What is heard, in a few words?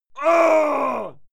male fight scream pain; soundpool